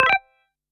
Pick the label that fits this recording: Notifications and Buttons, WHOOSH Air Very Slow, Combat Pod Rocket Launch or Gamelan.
Notifications and Buttons